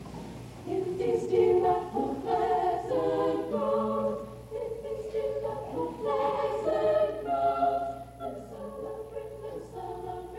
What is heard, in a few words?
Girls Choir